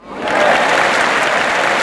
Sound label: cheer; Heaven Studio Plus; Rockers